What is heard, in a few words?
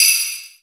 SELIGH BELL; AKAI S6000 CD-ROM - Volume 5; GM PERCUSSION KIT 2